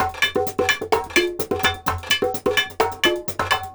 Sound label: Latin House Loop 128 BPM; Vengeance Samples